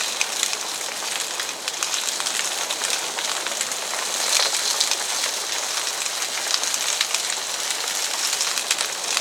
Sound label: Bushfire Plants; Boomerang Fu